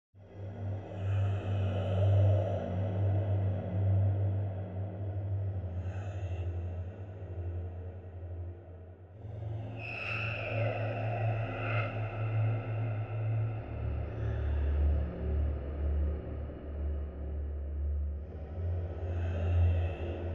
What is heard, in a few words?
Cube Ambience